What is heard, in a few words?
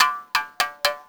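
SM MOUTH BOW; Spectrasonics; Heart of Africa 2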